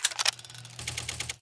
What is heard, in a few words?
quick spin; steamtower